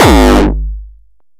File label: Bounce kick